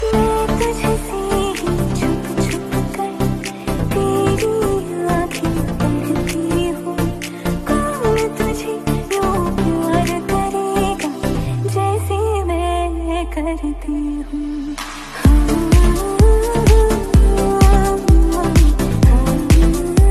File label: romantic hindi ringtone